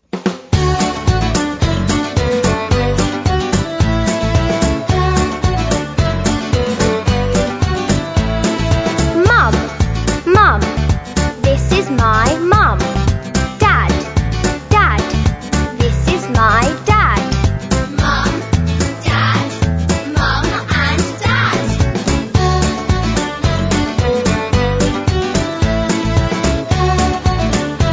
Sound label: Family chant